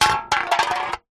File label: drink soda can empty drop knock over wood deck